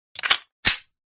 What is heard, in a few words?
explosion small; SFX